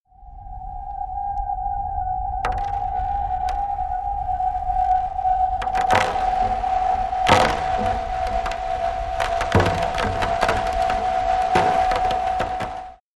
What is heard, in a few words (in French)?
harp dyn sable